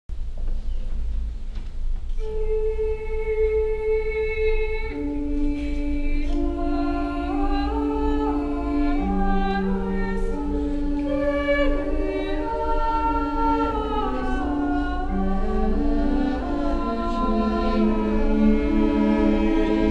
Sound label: kyrie missa o magnum, choir